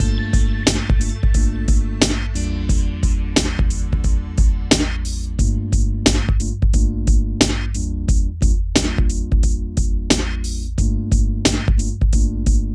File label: MIX, Hip Hop and R&B Drumloops